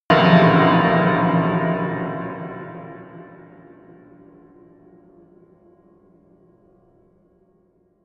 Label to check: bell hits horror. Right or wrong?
Right